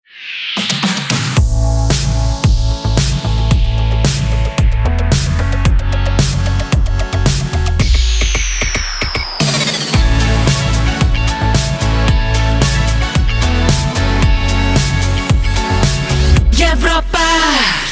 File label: Jingle B Ramp; Europa Plus